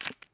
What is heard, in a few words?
dung hatchling sleep plop; Hollow Knight